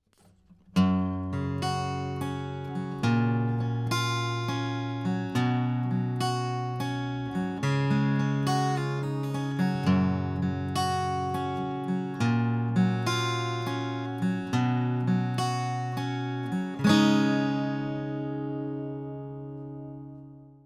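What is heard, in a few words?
guitar pick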